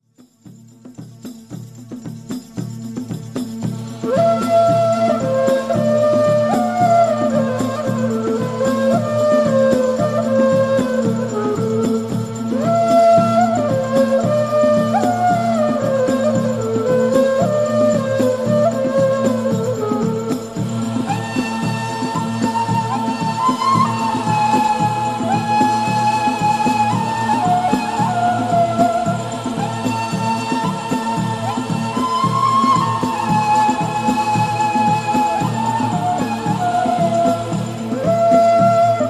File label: Ney